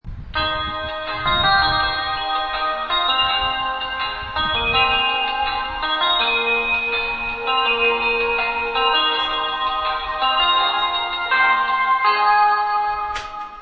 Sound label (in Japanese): hiroshima nyusen, ekihousou; 5番のりば入線メロディー; sanyo-line; JR西日本-広島駅